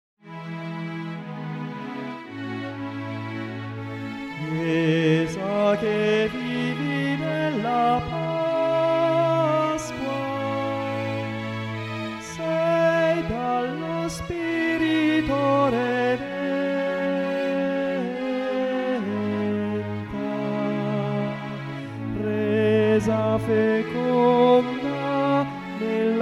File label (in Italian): tenori